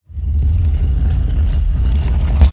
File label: sec door open